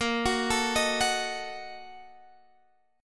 Bb Major 7th Suspended 4th Sharp 5th, Guitar, Drop D; arpeggiated; 20,21,19,20,19,x; BbM7sus4#5